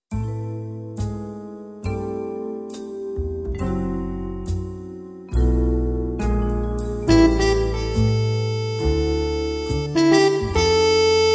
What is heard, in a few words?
mute trompet